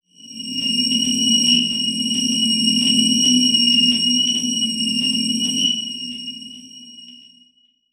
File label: Transition Stab Dark Medium